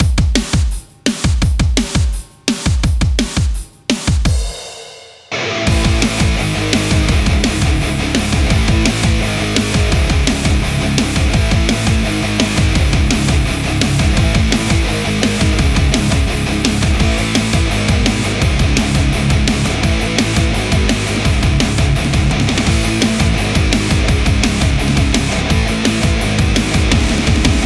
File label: Instrumental Metal Remix